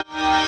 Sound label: Eb STAB, funky; Loopmasters; Funky Underground Vol 2